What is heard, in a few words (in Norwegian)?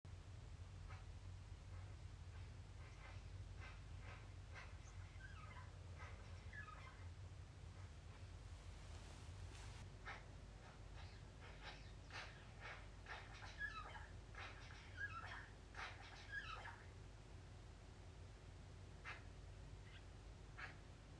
ukjent fuglelyd